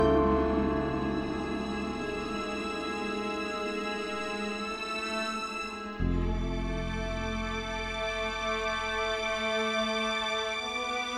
Steelworks Slow; rive wreck hack die retry; ps4

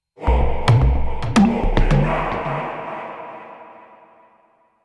basicMood Cueva Bosque

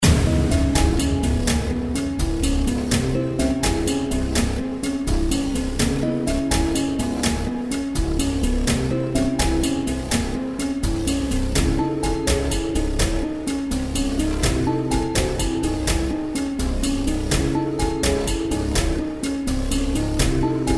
Raid; Mist Train Girls

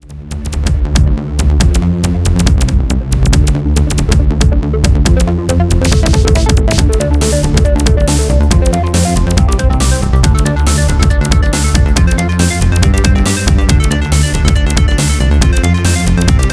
Boss Mech; fast striker; ps vita

